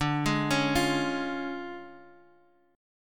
D Minor Major 7th Flat 5th D Guitar Half Step Down